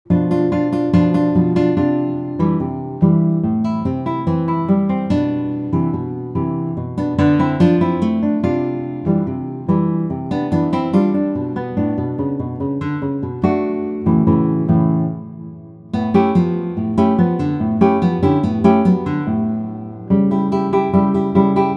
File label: Five Fingers Solo, guitar